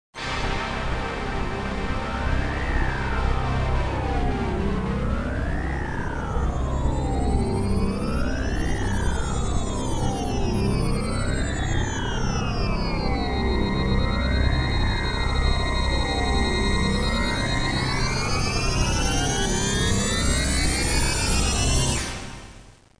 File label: sting